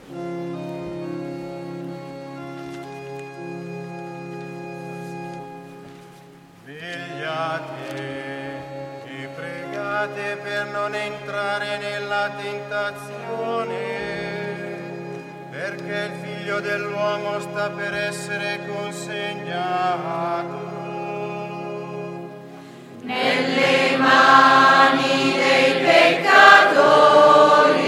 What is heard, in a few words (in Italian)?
Responsorio